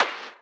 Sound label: BD Gate FX, Bassdrums; Vengeance Samples